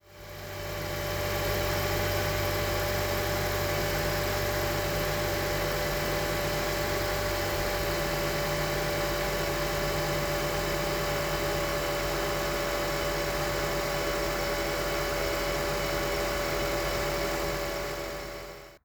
CRV idling

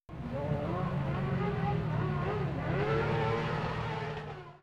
High Rev

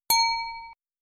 macos glass

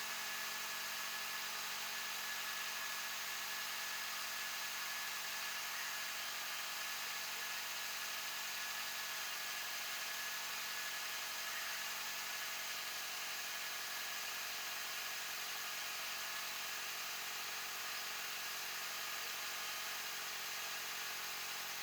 steady state with dogs 1500 filtered

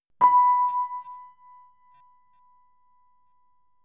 Piano B5 plus faded quiet sine

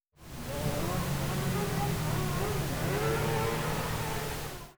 High Rev pink

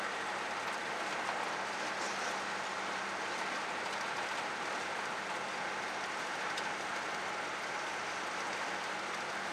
Truck sample filtered 1400

